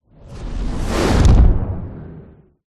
fantasy magic spell dark whoosh into thud

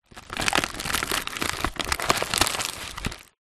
food popcorn paper bag movement

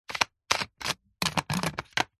foley bottle plastic lid screw or unscrew; zapsplat

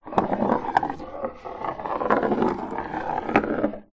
object drag on polished wooden floor; foley

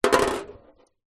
ball bearing set down on thin hollow metal; industrial; zapsplat

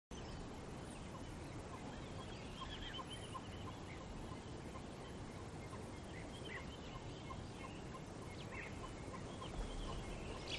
ATMO BUSH Water Hole Dusk Birds Insects Windy; smartsound

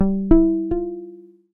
multimedia alert; dustyroom

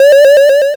leisure video game retro 8bit beam laser

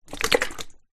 household kettle water movements

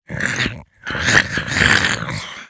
fantasy Goblin; little robot sound factory

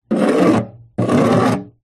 metal object scratch rusty metal surface; foley

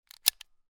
gun bersa; still north media

